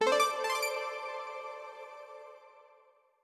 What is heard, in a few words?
Progression Stinger; viewfinder